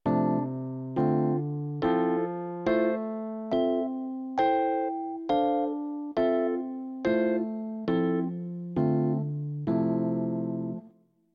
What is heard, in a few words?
minor practice